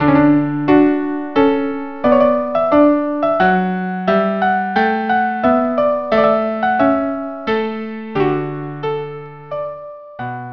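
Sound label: Serenade violin cello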